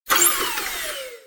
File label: swing; tridroidznnpc